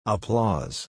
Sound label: applause